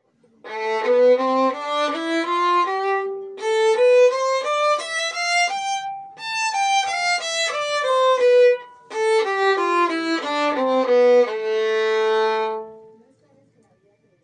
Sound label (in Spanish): Escala de la menor en silencio de corchea y corcheas